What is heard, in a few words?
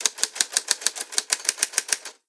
Typing; blue archive